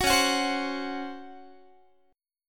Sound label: Db Major 7th Suspended 4th Db Guitar; DbM7sus4; strummed; tuning=4A,4E,5A,5D,5G#,6C#; 21,21,21,22,22,x